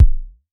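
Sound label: vermona drm1 mk3 bass drum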